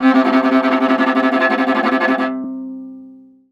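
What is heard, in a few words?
VIOLA tremolo C2; Orchestral; DirectWave; Varazdin Orchestral; Viola II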